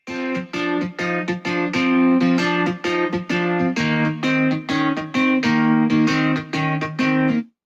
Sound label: blues